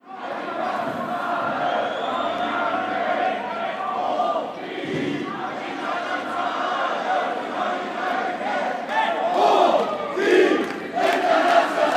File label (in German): amsel